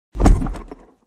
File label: car entrance